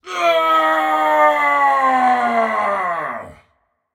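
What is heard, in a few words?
non verbal fear, voice; cernunnos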